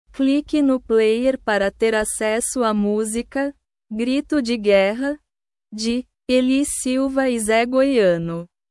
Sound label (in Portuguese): grito de guerra